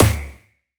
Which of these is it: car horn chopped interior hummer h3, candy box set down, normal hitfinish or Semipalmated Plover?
normal hitfinish